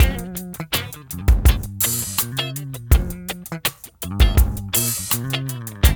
MIX, Funky Ass Loops; East West

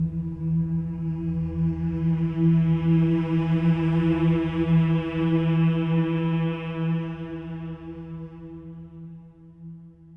MM E3, Symphony of Voices, swell; SWELL MMS

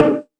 Lo Bongo Lo Fi, Drumset; Roland SH-32

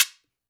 GUIRO, DANZON; InVision Interactive; Percussion Slam

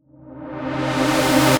Misc Short FX

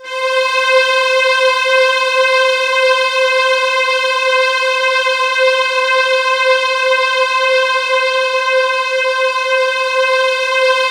C5, Synth Leads

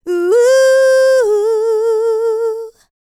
LICKS, Vocal, R&B; ILIO; Dance; LT R+B

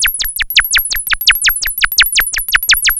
pulsating; Zero-G Ambient 1; PULSATIONS

